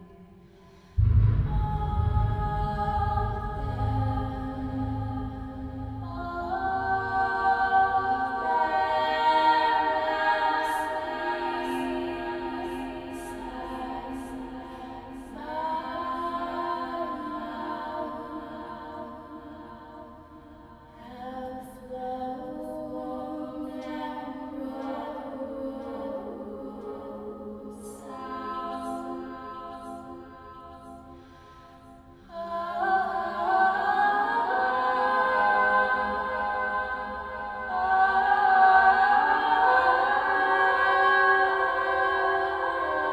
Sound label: chorus selection